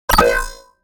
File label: Futuristic tone authorization error